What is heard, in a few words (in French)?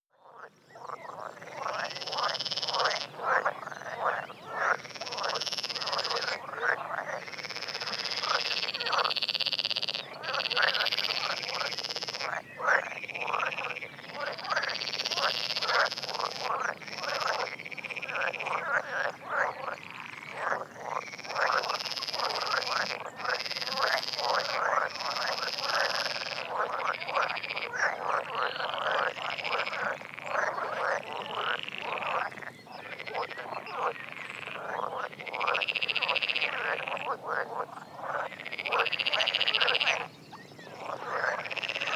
Grenouille de Lessona